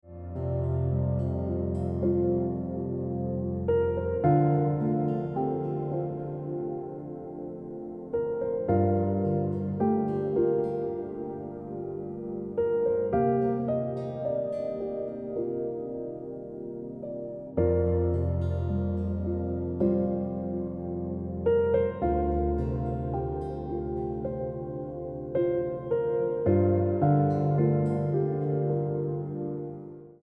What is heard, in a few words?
Ringtone